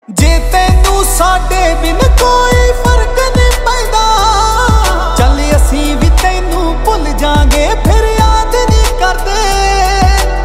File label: Ringtone